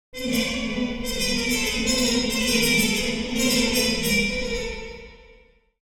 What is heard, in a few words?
Old vintage cartoon discomfort